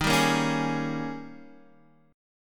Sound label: Eb 11th Eb, down, Guitar, Open D